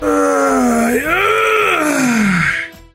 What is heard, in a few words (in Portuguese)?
ahh ahhnn ai espreguicando